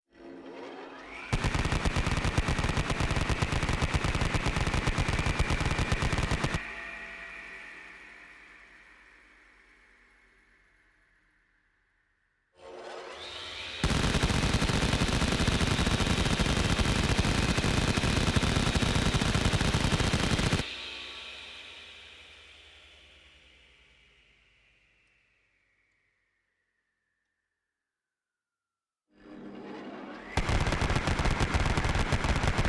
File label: vulcan machine gun series